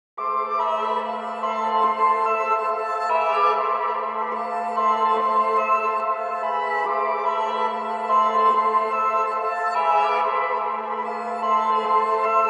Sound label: 144 BPM A Min High Pad, Reverse; Melody; Loop Stems; Cymatics; 2022 Melody Collection; Aim